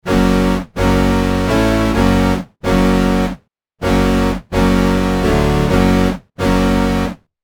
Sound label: synth